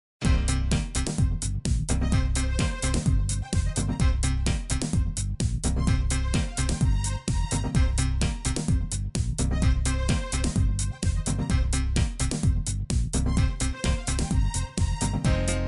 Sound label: Upbeat; Upbeat One